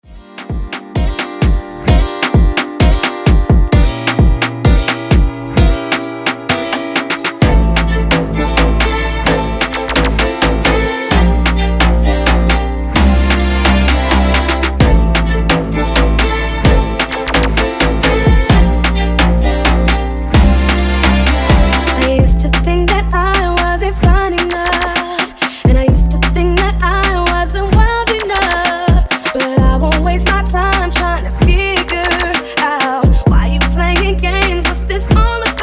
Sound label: baile funk breaker 130 bpm